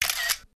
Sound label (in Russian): zvuk kamery, Звук камеры